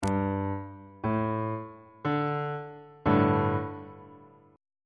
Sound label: d suspended 4th 1st inversion on bass clef